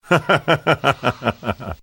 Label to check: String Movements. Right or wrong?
Wrong